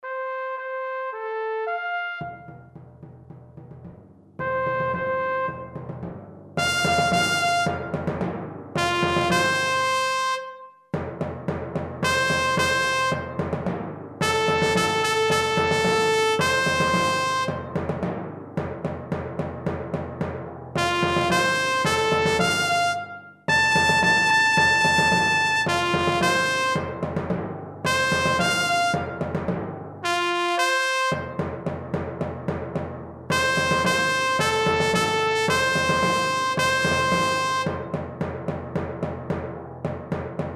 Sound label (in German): Trommeln u. Trompeten